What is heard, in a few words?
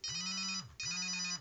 nokia 5510 ringtones ring ring